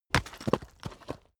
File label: stone stone drop